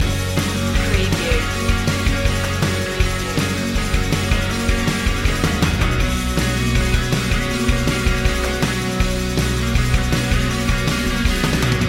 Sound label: loop